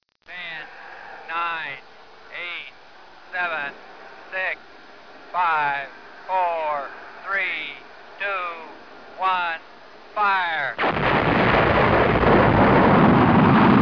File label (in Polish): start rakiety